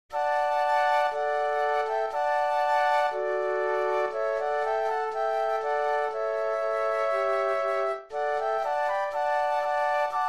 Flute Trio

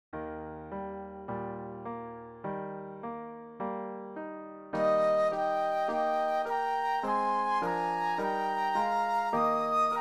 Flute Duet and Piano; classical; Humperdinck; Evening Prayer

